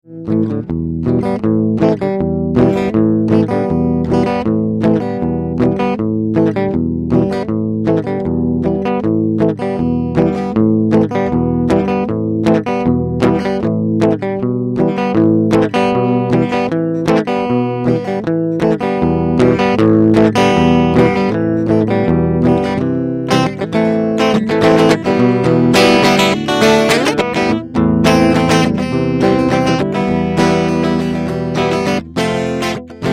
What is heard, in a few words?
guitar clean sample, bass guitar; cephisso